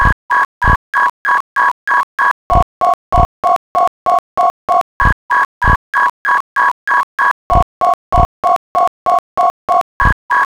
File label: sign wave